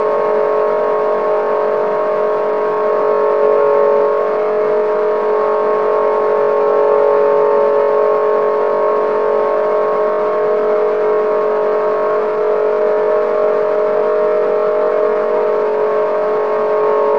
High Bypass Growl

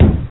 Compressor Stall

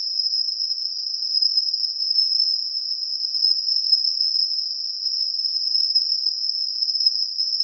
N2 Turbine

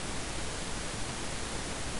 Radio Noise

